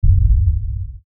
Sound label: LFE Hit, Lfe Hit Short Impact; Lfe Hit Short Warble Production Element Imaging Element Accent Transition